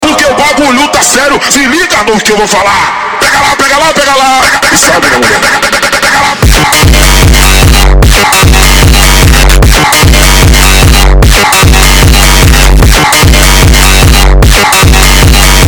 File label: montagem sped up